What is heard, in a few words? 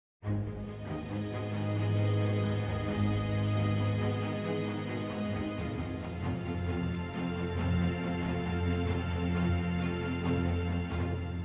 Music Success